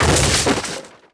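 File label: Wood Collapse Large; objects; Star Wars Obi-Wan; XBOX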